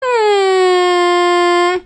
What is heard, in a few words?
airhorn; anna